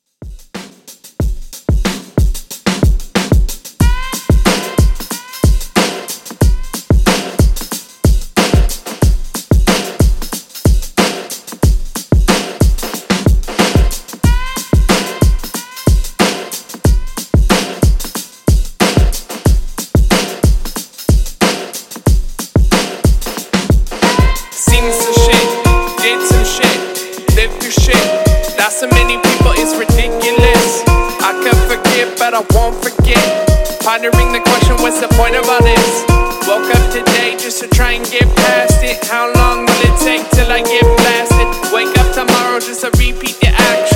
hiphop redrum 92 bpm

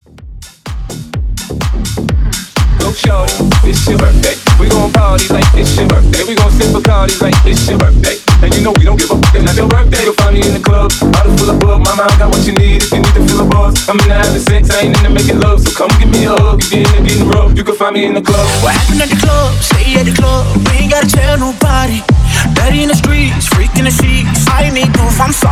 Hype Intro Rework 126 BPM

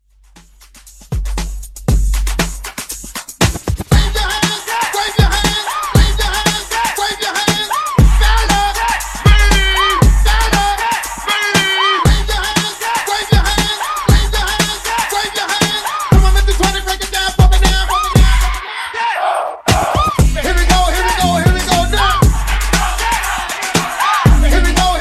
Funk Bootleg 118Bpm

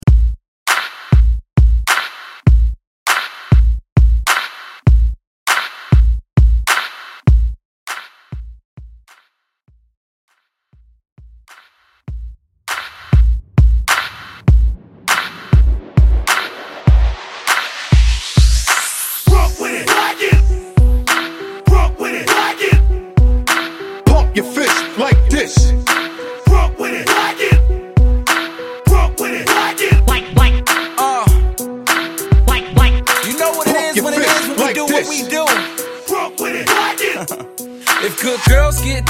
Mainstream Bootleg Halloween Blend Mix Hype; Vegas Edit

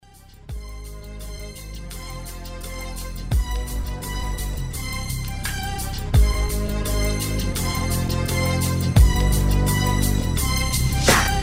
90s Big Horn Transition 85 126

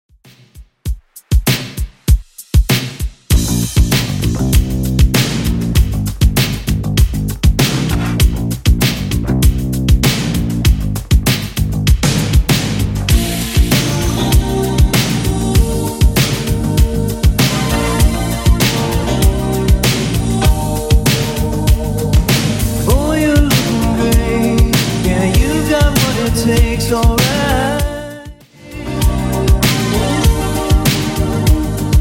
80s Redrum 98 bpm

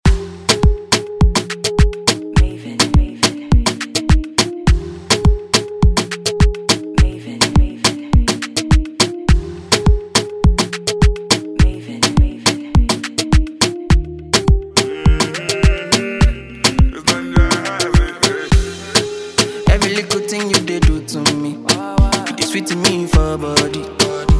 Moombah Afro Redrum

